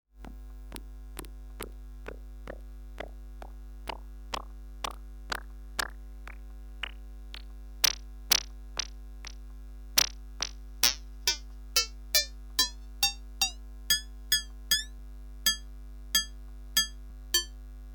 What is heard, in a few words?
Resonator Percussion